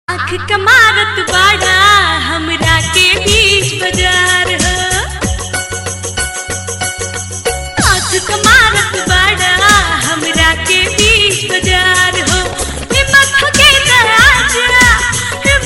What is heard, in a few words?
Bhojpuri ringtone